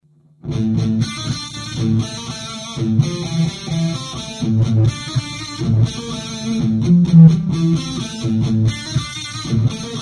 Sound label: trash